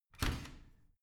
washing machine open squeak

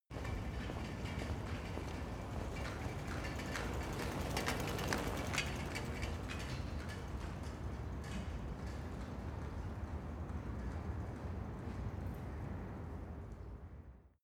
city europe bicycle

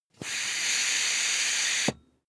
air pump ball hard

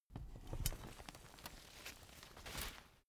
umbrella big close full soft

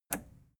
plastic button scratch release disable

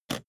suitcase latch lock jump open soft

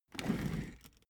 office chair trundle fast

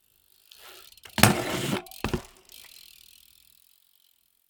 bmx maneuver arrive grind drive off

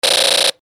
toy gunz

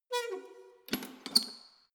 letterbox metal push close lock

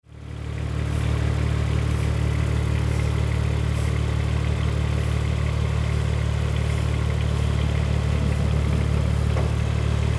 forklift truck at work with beeping

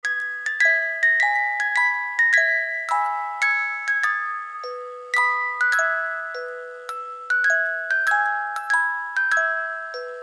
music box beethoven

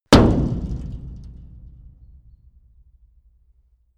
metal impact tank hard

